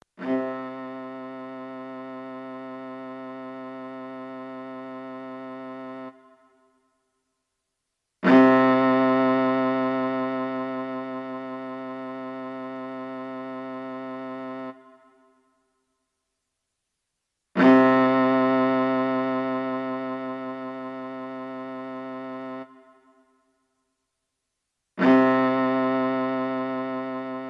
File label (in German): viola c